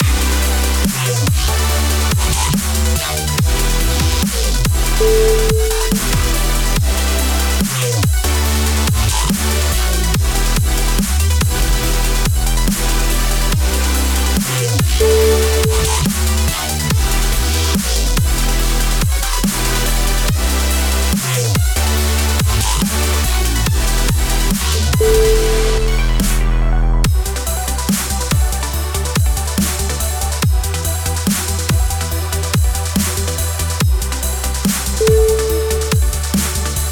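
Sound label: dubsteppin, music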